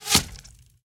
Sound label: bow hit; Minecraft; Conquest Default